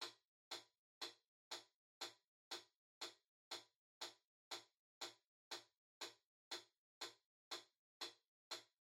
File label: Metronome Far Click Loop Mid